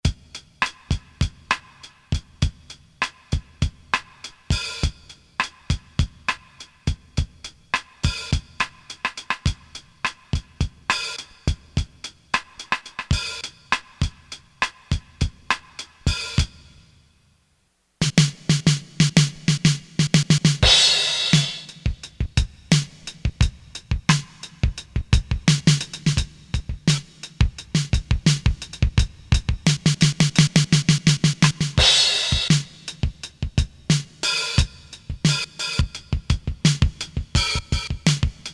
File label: Drum, Korg DSS-1; Drums